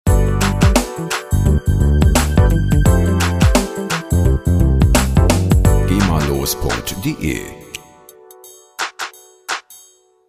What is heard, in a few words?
hip hop tusch